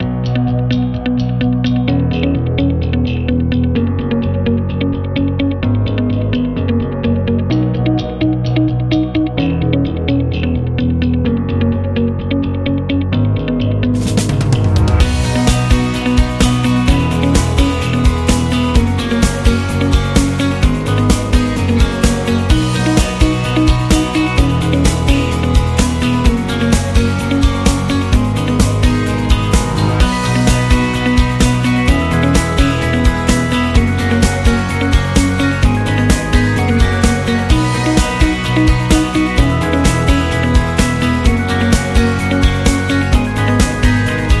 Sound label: Dynamic Corporate Music